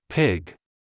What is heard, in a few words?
pig